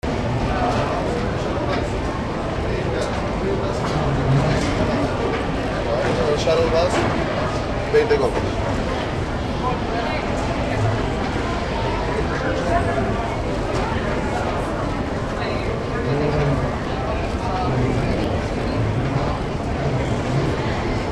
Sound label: airport arrivals area